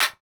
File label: Modern clap, Electro